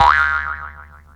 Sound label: spring activate